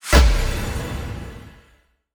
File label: Hero Death FX - G6(9)#11, Video Game; Cymatics; Video Game Sample Pack Vol 1